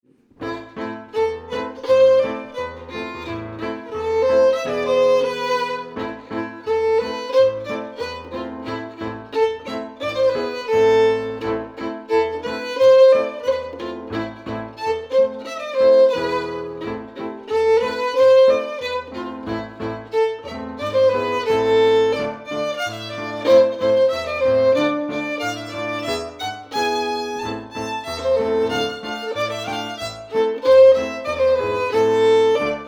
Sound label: FIDDLE PIANO; Jink & Diddle Book o' Tunes 2022